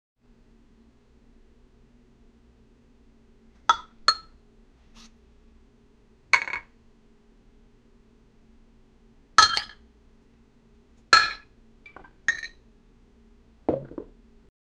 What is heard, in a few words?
milk nice cool sounding